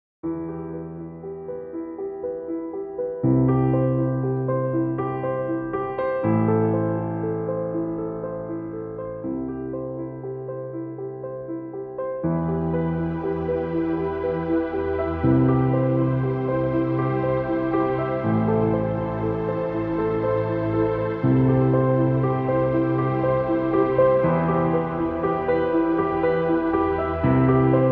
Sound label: Piano